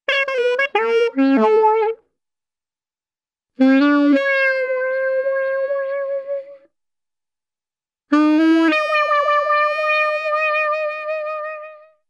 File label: Alto Sax Playing Short Upbeat & Sad Melodies; Cartoon; Hollywood Edge; Cartoon Trax